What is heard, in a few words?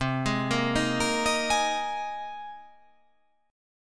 C Minor 7th Sharp 5th; down; chord shape